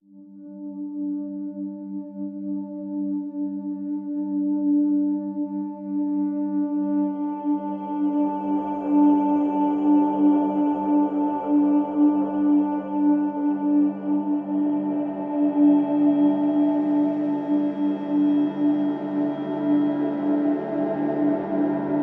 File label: Questionable No Low Pulses